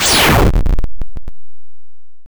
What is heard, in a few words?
rocket shot; RocketPatrolMods